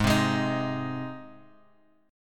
Ab Major Ab down Guitar Open G

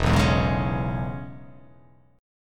E 6th, down

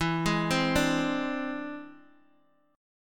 E Augmented 7th E down Guitar Open D

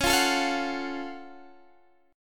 F sharp Minor Major 7th

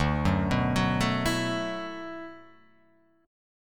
D 6th Add 9th D

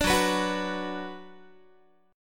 F sharp Suspended 4th Sharp 5th Guitar, chord; strummed

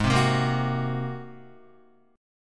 G sharp 9th G sharp Guitar; G#9 strummed; JGuitar

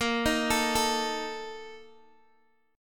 A sharp Major 7th Suspended 4th, Guitar DADGAD

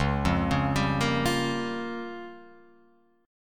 Db 6th Flat 5th Db down Guitar Open F; Db6b5 arpeggiated; JGuitar

